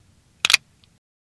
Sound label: 357 Magnum Gun Cock